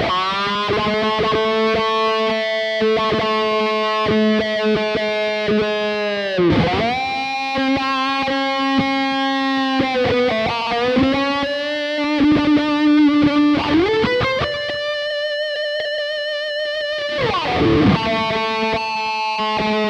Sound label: Spaceman Artemis Chaos Filter Lead